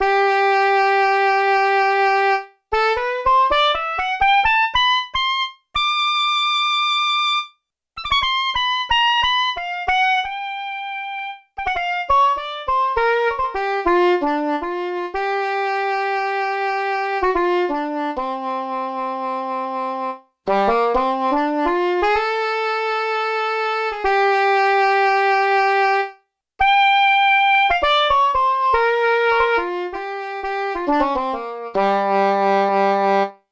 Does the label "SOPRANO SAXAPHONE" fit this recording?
Yes